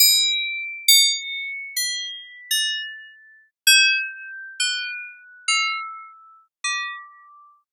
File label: bell synth; Max